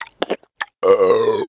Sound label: Zombie Eat Burb